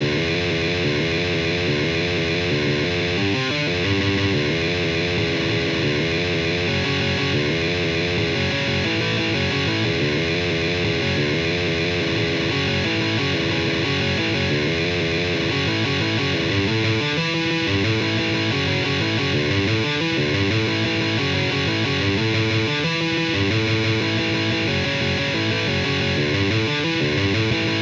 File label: punk guitar